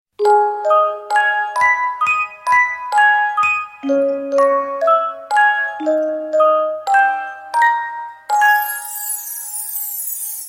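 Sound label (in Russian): мелодия из колыбельной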